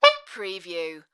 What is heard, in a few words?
Sax Squeak